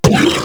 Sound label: Flying Mouth Pain; Warframe; ManInTheWall